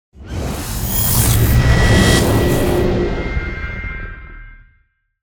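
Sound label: cinematic stingers; Sonniss GDC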